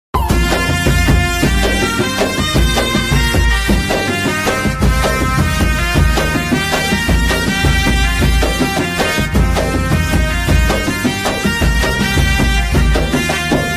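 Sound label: folk background music ringtone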